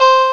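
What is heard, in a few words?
Harpsichord (C2); X-Wing; X-Wing Patches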